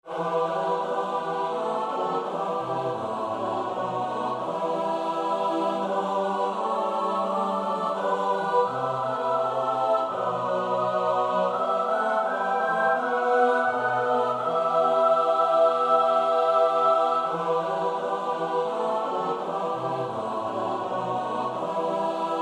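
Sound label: soprano alto tenor bass; Bach (Johann Sebastiaan)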